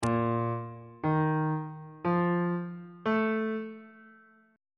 b flat major 7th suspended 4th chord intervals on bass clef